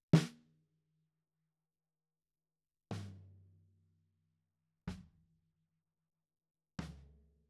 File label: SNR Top